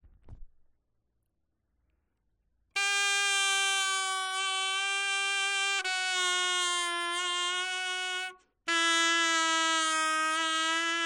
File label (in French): bending